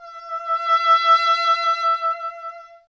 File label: WND, Solo Instruments; E.HRN; Miroslav Vitous - Solo Instruments VOL-2